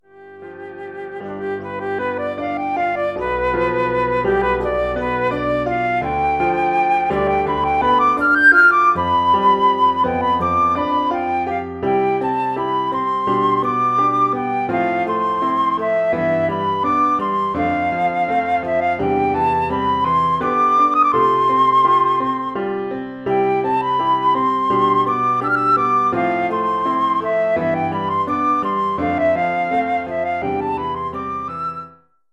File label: flute solo